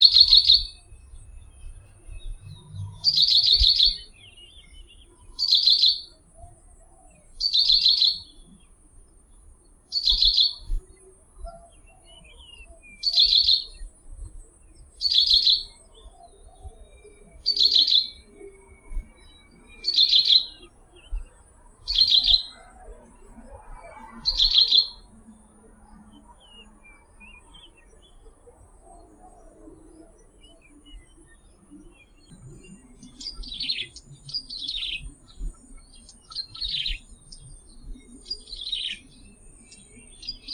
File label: yellow-bellied prinia; KUCHING